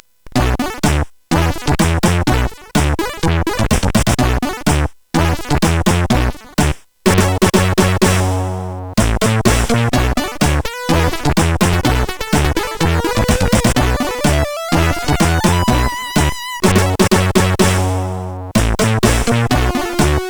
JAZZZZ, CSG8580; hvsc

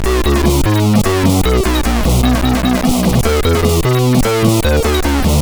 Test, CSG8580; hvsc

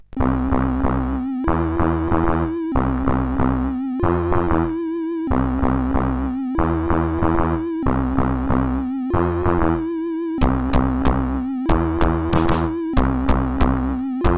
Morse Code, CSG8580R5; hvsc

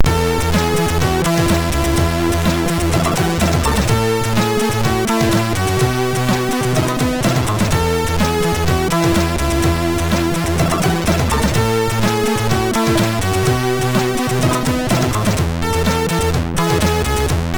Balade, sid MOS6581R3

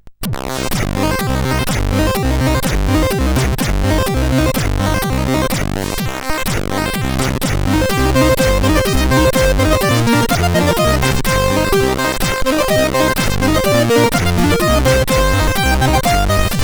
Polka, CSG8580R5; sid

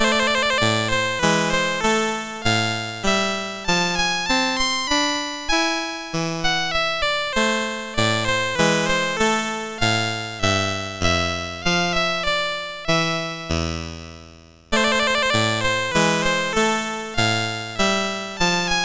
Minuet in A Minor, MOS6581R4

